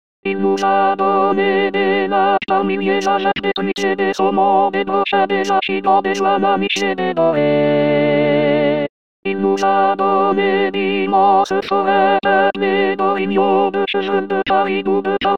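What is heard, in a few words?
alto; messe queb 12 chant de sortie extract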